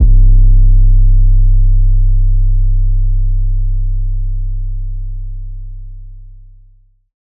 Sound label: 808 C; Advent Calendar - Day 9 - 808s